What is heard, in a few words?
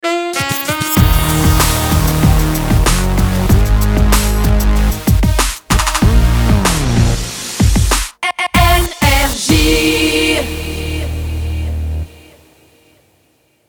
Jingle Ramp; NRJ